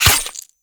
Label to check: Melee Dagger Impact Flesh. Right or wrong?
Right